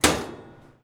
Laundry Dryer Door Slam; Home & Office; Sony Pictures Sound Effects Series Volumes 1-10; Sony Creative Software

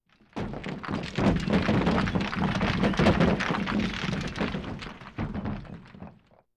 Rocks Rubble Sweetener; Weapons & Explosions; Sony Pictures Sound Effects Series Volumes 1-10; Sony Creative Software

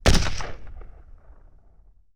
AK-47 Single Shot; Weapons & Firearms; Sony Pictures Sound Effects Series Volumes 1-10; Sony Creative Software

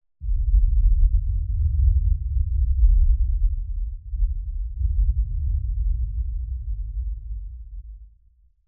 Explosion, 5.1 Surround; SUB; Sony Pictures Sound Effects Series Volumes 1-10; Sony Creative Software

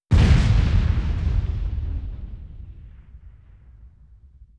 Explosion Medium; Weapons & Explosions; Sony Pictures Sound Effects Series Volumes 1-10; Sony Creative Software

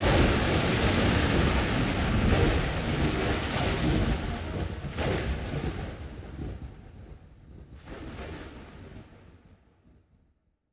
Lightning Strikes Multiple, Thunder & Lightning; Sony Pictures Sound Effects Series Volumes 1-10; Sony Creative Software

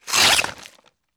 Sword Cut Through Flesh; Weapons & Explosions; Sony Pictures Sound Effects Series Volumes 1-10; Sony Creative Software

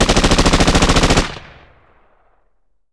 Machine Gun Bursts; Weapons & Explosions; Sony Pictures Sound Effects Series Volumes 1-10; Sony Creative Software

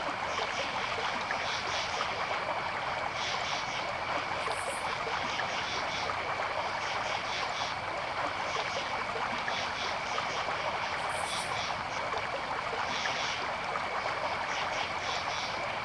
river, Amb; RYL 2 v1673; Private RYL

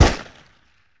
Pistol Shot With Echo; Weapons & Firearms; Sony Pictures Sound Effects Series Volumes 1-10; Sony Creative Software

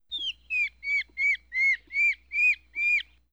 Monkey Chirps; Animals; Sony Pictures Sound Effects Series Volumes 1-10; Sony Creative Software

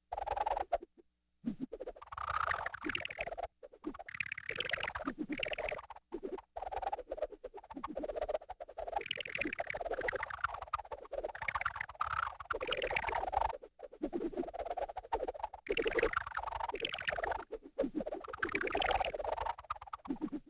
Whipper Bug, Vocals - Humanoid; Sony Pictures Sound Effects Series Volumes 1-10; Sony Creative Software

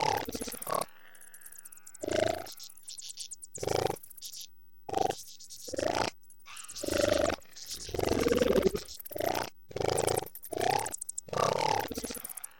Ganglion Eater, Vocals Humanoid; Sony Pictures Sound Effects Series Volumes 1-10; Sony Creative Software

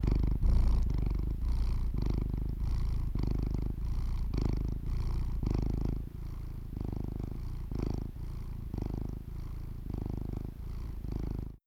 Cat Purring; Animals; Sony Pictures Sound Effects Series Volumes 1-10; Sony Creative Software

